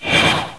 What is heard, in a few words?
Total Flying